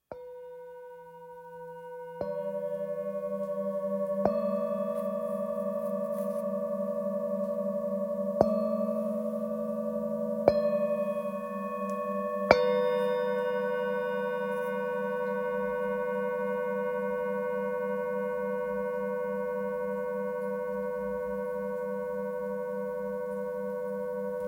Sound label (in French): Set 3 fullmoon